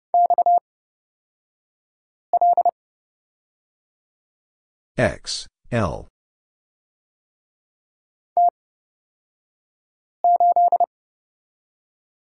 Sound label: Letter x Two Characters 30wpm; Lesson 37d